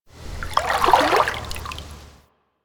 Water Lapping Gentle Small; Ambience; Warframe

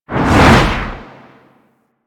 Dodge Roll Left Low; Movement; Warframe; Archwing

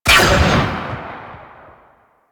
Gunblade Automatic Shot Release, Weapons

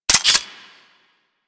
Grenade Launcher Mech; Grineer

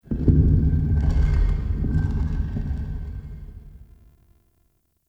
Crypt Distant Stone Texture B; Ambience; One Offs; Champion Crypt

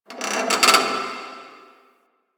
Metal Creak Small; Warframe; Quests; TheNewWar; Ambience; Zariman

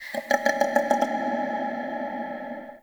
Small Creature; Ambience; Dungeons; Under City

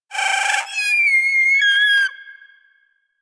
lure call; Warframe; Hunting Critters; Avichaea

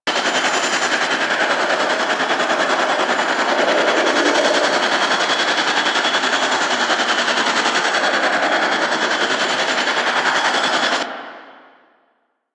Movement Slow Engine Loop, Vehicles; Warframe; Archwing Demolition

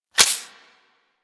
Pistol Mech Clip; Weapons; Warframe; Tenno; Guandao

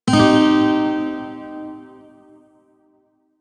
Shawzin Pent Min Chord L; Music; Warframe

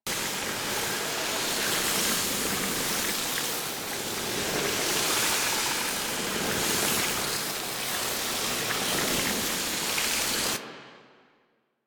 Alchemy Grenade Hold Loop Toxin; Warframe; Entrati

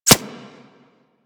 Arrow Impact Default; Warframe; Weapons

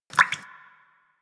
Water Drips; Ambience; Props; Warframe; The New War; Zariman; Quests

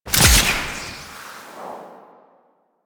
Grapple Throw; Enemies; Weapons; DE; Warframe; Teshin

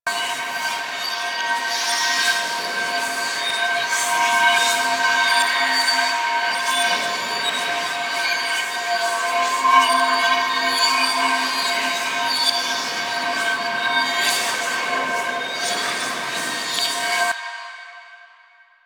Invasion Shrine Loop; Warframe; Cetus

